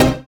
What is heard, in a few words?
Synths Root C